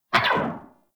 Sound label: Pulse Laser Tail; Warframe; Railjack; RailJack Weapons